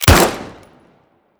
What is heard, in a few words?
elite; arccw go